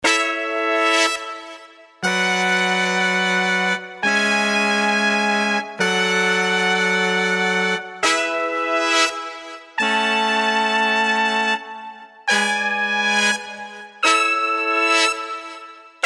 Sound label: smartchord